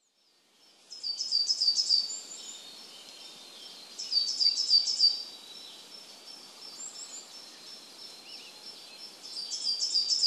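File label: higara, Coal Tit; chichibu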